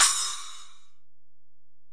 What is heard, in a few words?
Ride, Alesis DM-PRO